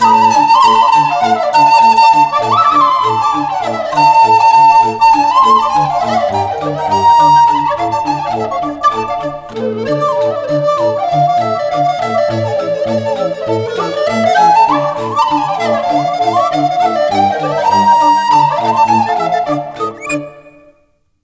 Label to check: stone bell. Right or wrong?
Wrong